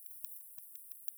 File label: Plecotus austriacus; europe